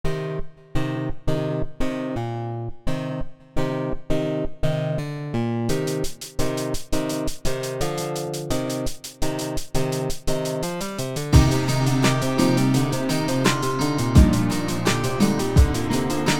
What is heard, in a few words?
guitar mix; Steel Ballad, Country side , Ooame & Army of cans (Centurion of war vs Tad vs Zhelanov)